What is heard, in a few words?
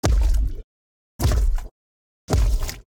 seal angel